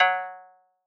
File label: Percussion; Shockwave; Cymatics; House Starter Pack